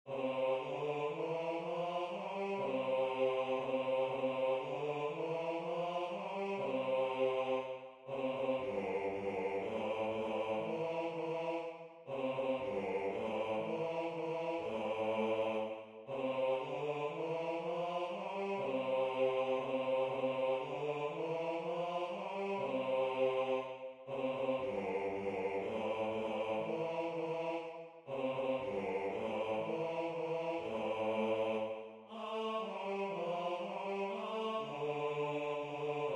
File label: Bass; La Caccia